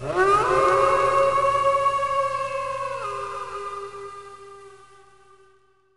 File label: Howl; Frogatto; Makok